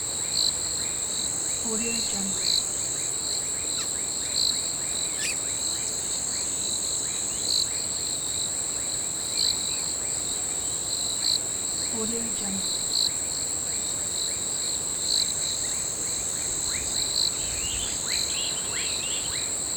crickets and birds

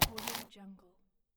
small shovel digging in grass; stormwaveaudio

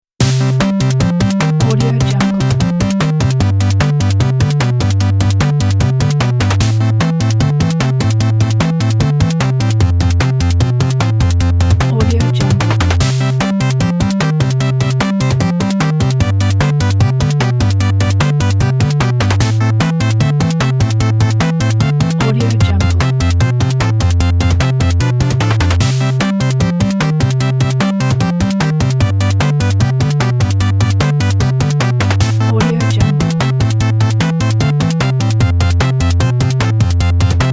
8 bit retro game; borisnadolsky

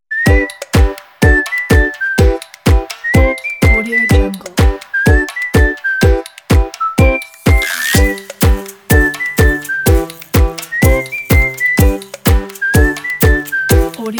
childrens march

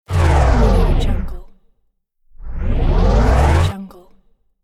slow motion

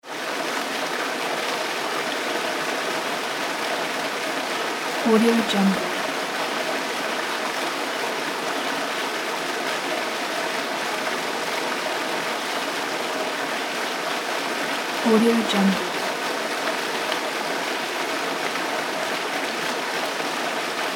backyard waterfall; mountainaudio

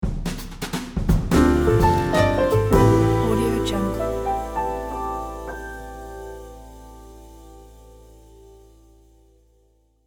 show ident

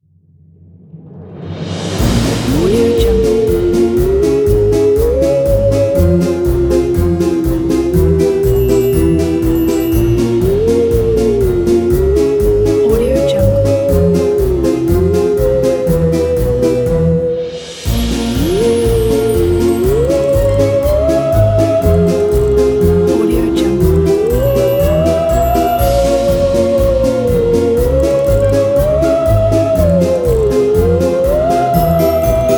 halloween

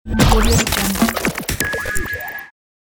glitch; 3dhome

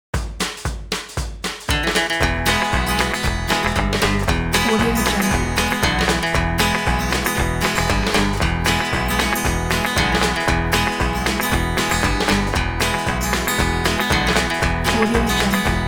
country fun; remstunes

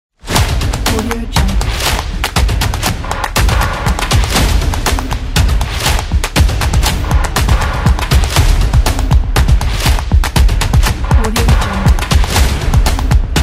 stomp industrial; dniprolandmusicprod

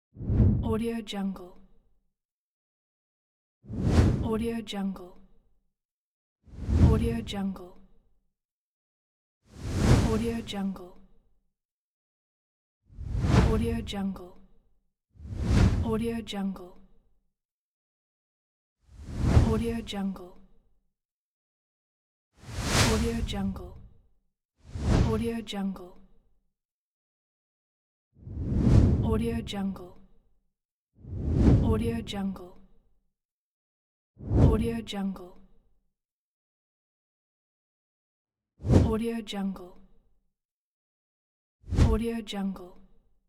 classic swoosh pack sound effects

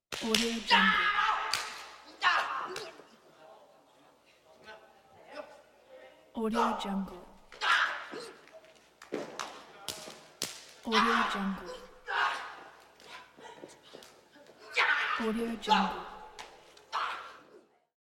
kung fu fighting scene sounds

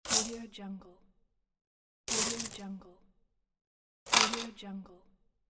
coins

